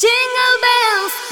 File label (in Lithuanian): sms dzinglbels